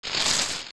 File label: Crawl; Gohma